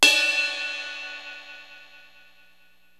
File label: cup, rx5; RIDE